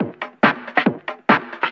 Breakbeat 140 BPM

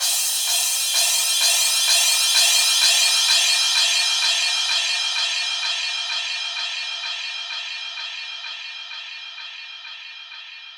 Downsweeps

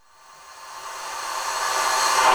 Sweeps Medium

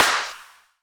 Clap; Vengeance Samples; VEC1 Claps

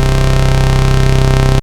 E Lead C1, Moog Voyager; Moog Voyager Sounds; VES1 E Lead

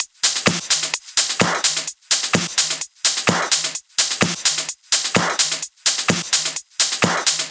128BPM Kit; Vengeance Samples; Doubleride Kit

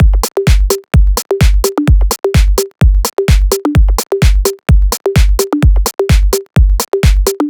128BPM Drums, Tech House; Averna; Vengeance Samples; Bonus TechHouse Kits

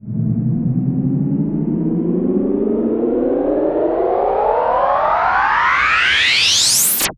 Uplifter

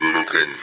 Synth Sound; Vengeance Samples; VEE Synth Shots

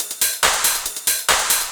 Loop 140 BPM; vengeance essential club sounds-3

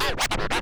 Cuts, Vinyl Record Samples

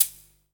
Closed Hats, Cymbals

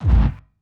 BD Gate FX, Bassdrums; Vengeance Samples

